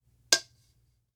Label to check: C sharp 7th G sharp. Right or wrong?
Wrong